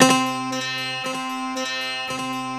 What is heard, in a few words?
dulcimer, Kawai; Rubber Chicken Software Sample Bank